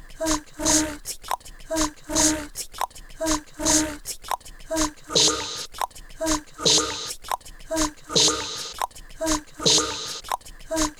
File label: haha